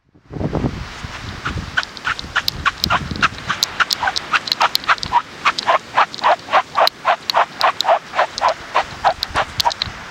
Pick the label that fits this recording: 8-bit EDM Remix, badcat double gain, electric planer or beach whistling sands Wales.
beach whistling sands Wales